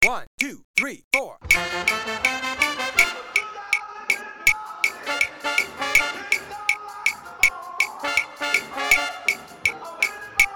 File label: Band